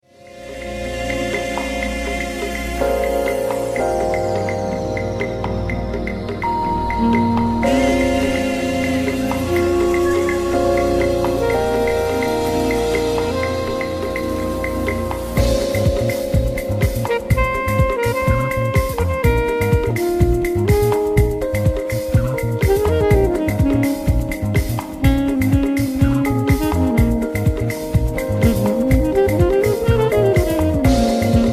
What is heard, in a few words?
lounge sax solo